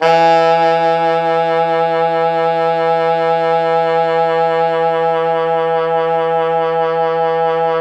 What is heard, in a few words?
Pop Brass, E2 -L; Best Service ProSamples vol.19; AKAI